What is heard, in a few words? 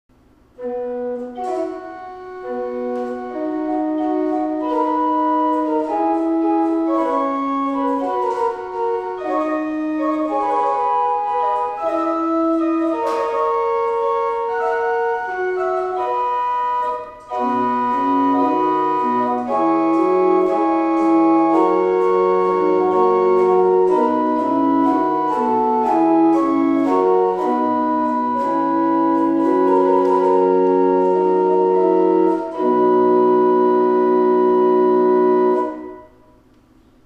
Grob Gedackt Viola da gamba 8 und Flauta 4, Tannenberg Organ; Gemeinhaus Saal - Old Salem, North Carolina